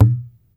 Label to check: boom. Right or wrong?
Wrong